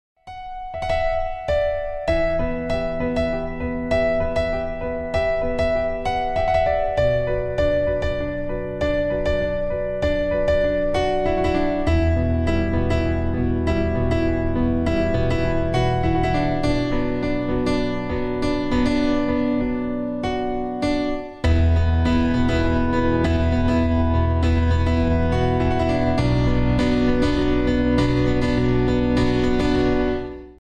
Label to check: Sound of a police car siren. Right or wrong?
Wrong